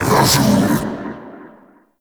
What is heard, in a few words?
Uriel Brimstone Cast Vocal; Warframe